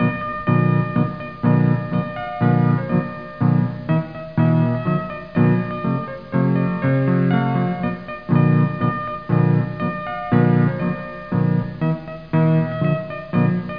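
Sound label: jingle bells; funexmas; holiday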